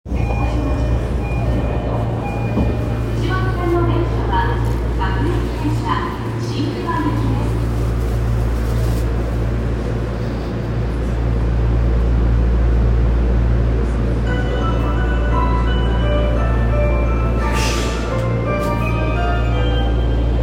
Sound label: train sounds; 有楽町線麹町東